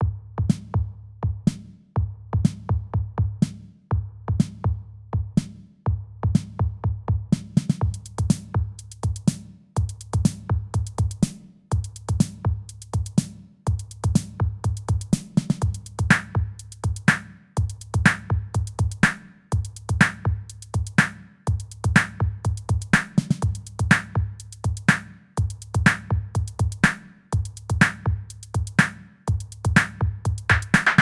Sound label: ghetto blaster kit